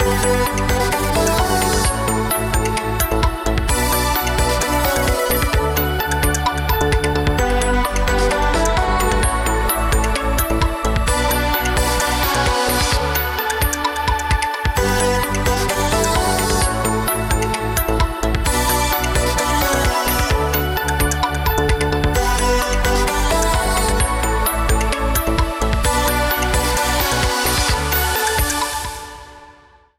Punjabi Folk Reverie; vlog

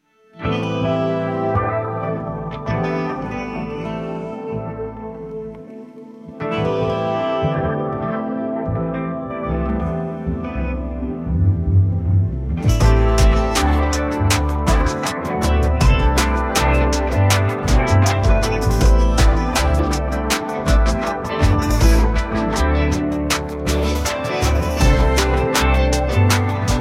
string World

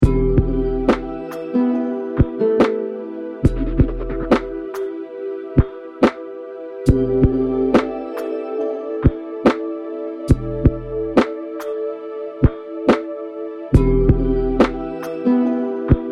Autumn Maple Leaves, lofi; lofi train

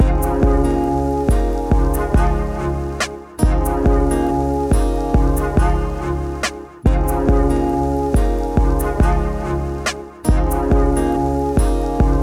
Use piano and strings to create a grand sound.

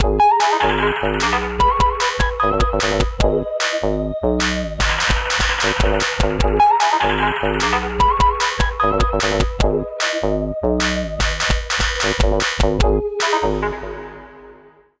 Mystic Dungeon Adventures, dark sound

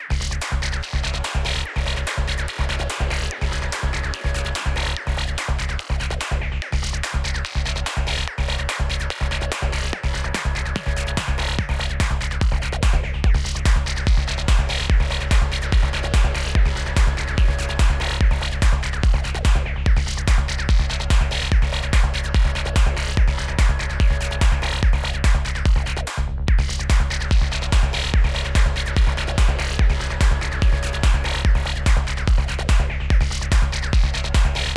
rock

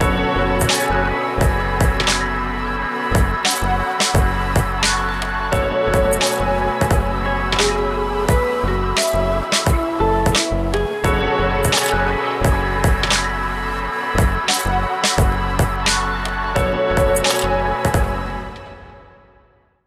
Enchanting Ghazal Whispers; yuttari

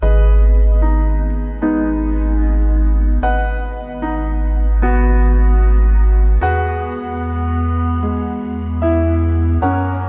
Relaxing Summer Music; yuttari sound

